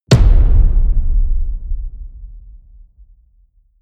drum hit simple large

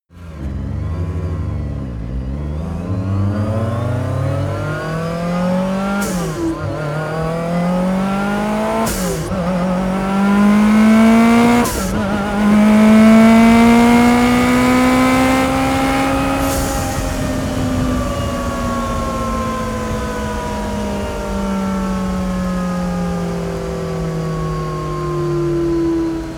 car lancia s4 long acceleration deceleration

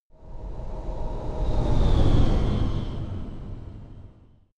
sci-fi vehicle train passing; raumschiff

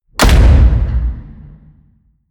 slam, tueren

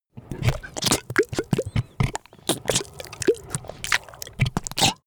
monster flesh eating; comedy-cartoon; soundpool

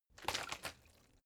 bottle water sparse shake single soft; berufe

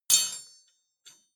metal impact bar rattle; industrie-wirtschaft

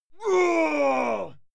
male fight scream pain; soundpool

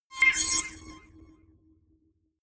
notification accept danger; signale

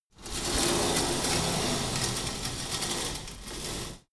pullup machine cable pull, fitnessstudio; sport freizeit

